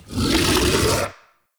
Pit Monster Spit Inhale; Warframe; Infested

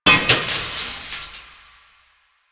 Chair Stack Destroy; Warframe; Ambience; Nineteen Ninety Nine

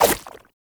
Baby Jump; Warframe; Nokko Colony; Visions

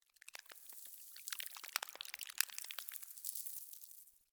Spine Move Close; Ambience; Misc Outdoor; Warframe; Infested Eris

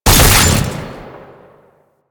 Alt Shot, Weapons; Warframe; Fulmin Prime

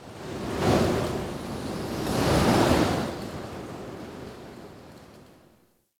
Wind Gust; Warframe; Corpus; Ambience

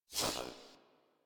UI Corpus Theme Window Close; DE; Warframe

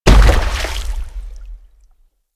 Infested Femur Step Water; Warframe

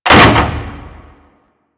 Mover Metal Clunk; Ambience; Props; Warframe; Orokin Derelict

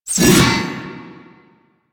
Backstab Impact Target; Warframe; Warframes; Pax Duviricus

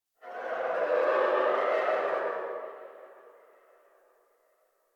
Tree Distant Critters Angry; Ambience; Warframe; Duviri

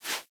Foley Idle Paw Swipe Quick; Warframe; Kubrow

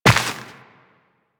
Step Dirt, Footsteps Walk; DE; Warframe; Tenno

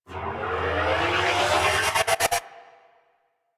Cart Turret Energy Ball Charge Up; Warframe; Venus; Venus Turrets; Venus Cart Turret Energy Ball Agent

